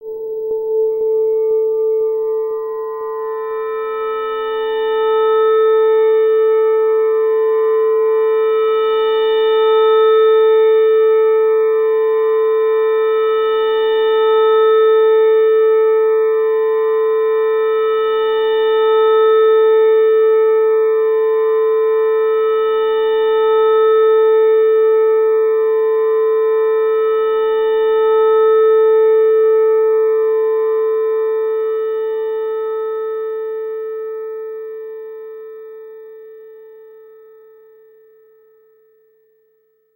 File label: Dreams, Ambience; Sonic Foundry (Sony Creative Software); Numina II